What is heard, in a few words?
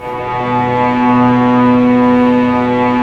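STR Cellos Ponticello, Bow FX; Roland LCDP08 Symphony Orchestra; STR Vcs Bow FX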